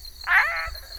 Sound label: Bokermannohyla hylax